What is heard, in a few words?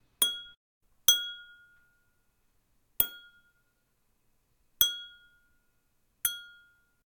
Wine Glass Hit Higher Pitch; George Karagioules; The Source Collection SFX Pack